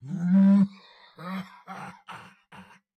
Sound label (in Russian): Смех; Турбосвин